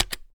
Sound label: click; GunfightShoot